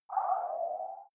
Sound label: game start dull